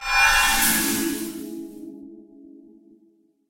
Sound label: timer resume